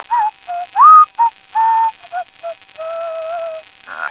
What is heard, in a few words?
whistle, taunts